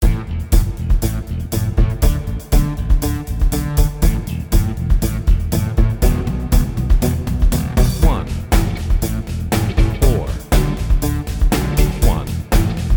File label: I IV I V Rock A